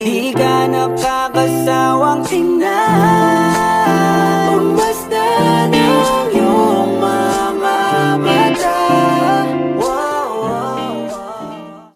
chorus

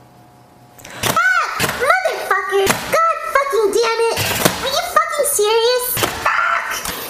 crash

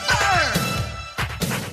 fire

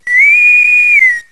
computer whistle

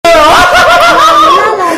ahahahahhaa

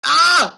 pain

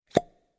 suction bubble poof pop ui; ancient game

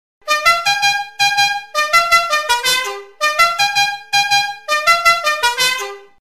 trompes

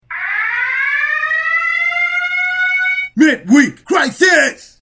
siren; midweekcrisis

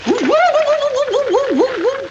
wuwu

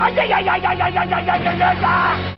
ayayayayaya

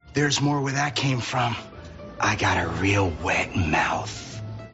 real wet mouth

